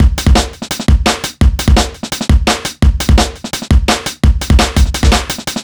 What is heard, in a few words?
E Beat, Electronic Beats; KJ Sawka; Drum n Bass & Beyond